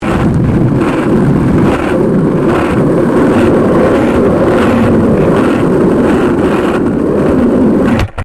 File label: SKATEBOARD UNDERSIDE ASPHALT ROUGH; Skateboard sounds; Skateboarding (Sound Effects:Sports)